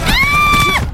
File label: ahhhhhhh